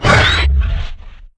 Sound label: pain; svencoop; tor